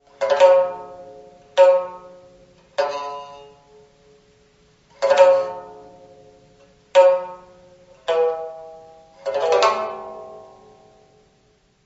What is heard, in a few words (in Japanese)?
五絃琵琶